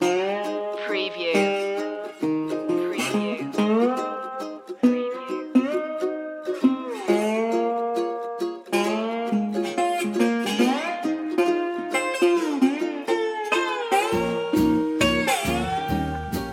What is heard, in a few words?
tiki beach loop